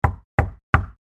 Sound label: Foley Door Knock Solid Door; Objects